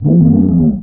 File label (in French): Grognement